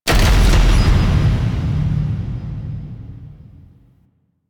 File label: Cylinder Stop; Warframe; Entrati; Vaults